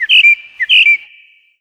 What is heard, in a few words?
Chirping Birds